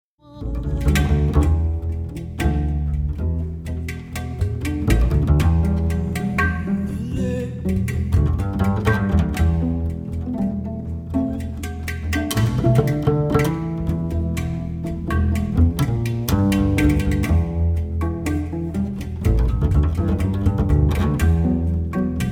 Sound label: bass, bass solos; the island